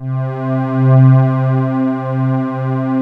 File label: pulse pad C3; INPULSE